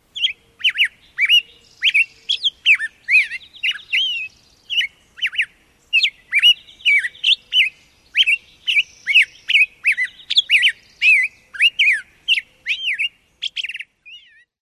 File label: extended song